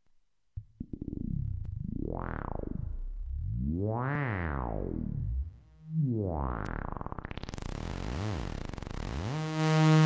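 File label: redsquare, synth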